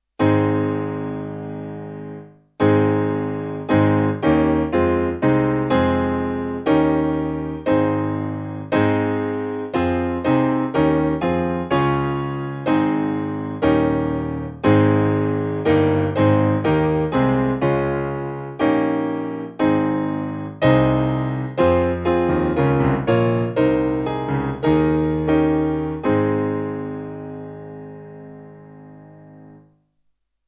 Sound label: The Doxology, Old 100, Piano